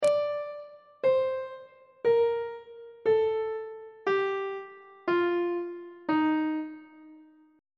d sharp lydian mode descending; on treble clef

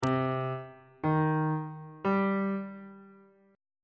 c minor triad chord on bass clef

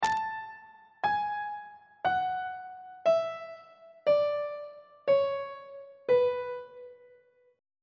b dorian mode descending; on treble clef

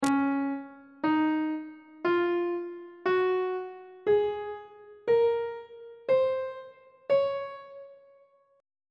c sharp major scale on bass clef

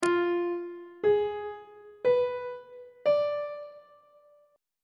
f diminished 7th chord on treble clef

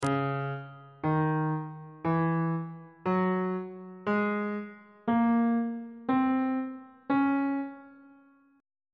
d flat melodic minor scale note positions

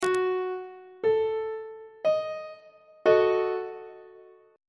d sharp diminished 1st inversion on treble clef

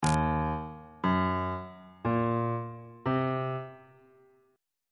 e flat minor 6th chord intervals

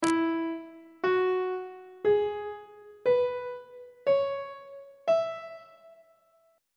e major pentatonic scale on treble clef

